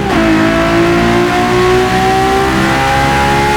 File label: second, vehicles